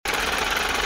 Money Counter

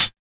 Closed Hats

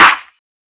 Clap

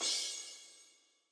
Crash

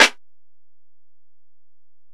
Snare

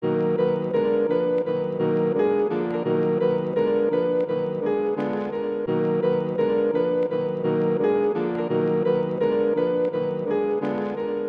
dark melody

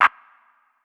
Clap

